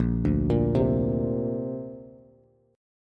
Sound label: Bb Major Add 9th, Bass; arpeggiated; 6,5,8,5